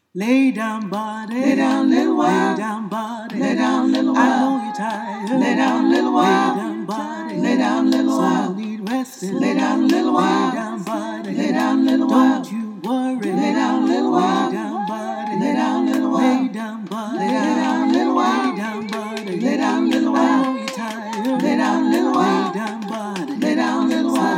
Lay Down Body All

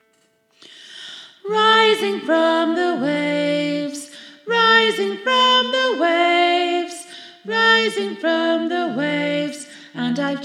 sop, gospel choir

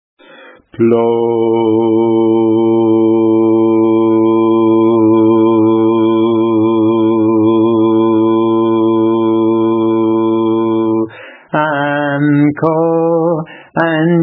Bass

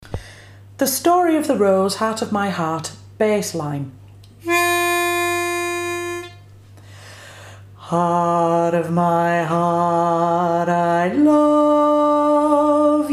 Bass

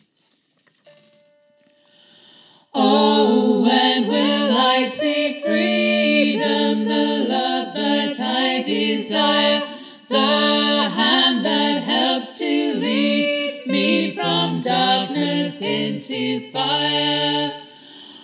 all, Gospel Choir; when will i see freedom; Whitby Songs

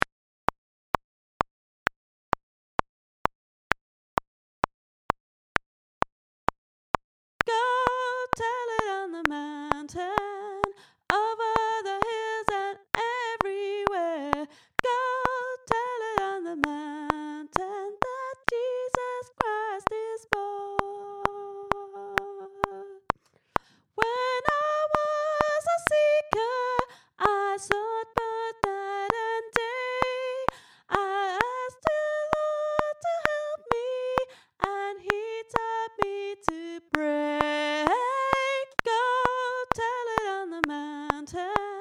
Solo; Go Tell It

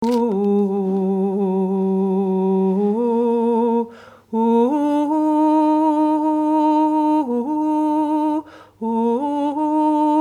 Tenor

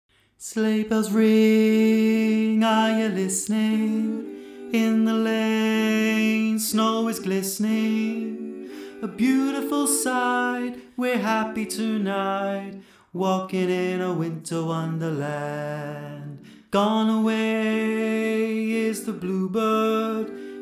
Bass, gospel choir

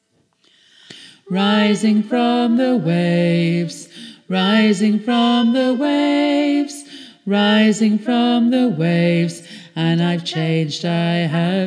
all, Gospel Choir; rising; Whitby Songs

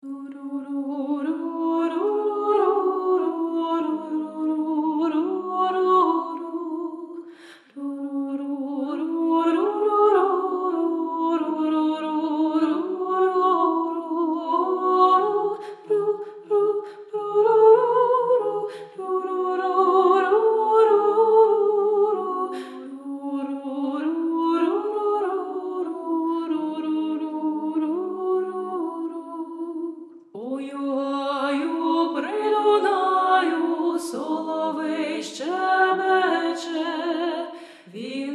Oi u Haiu Sopr

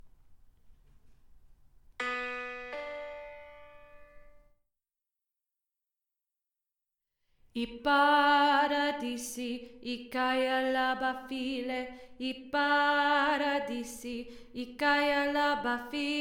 Tenor

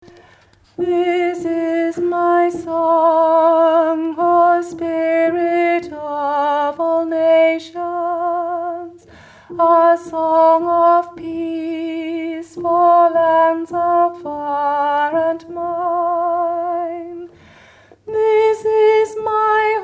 Alto; Finlandia

